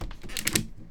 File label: Click Data Error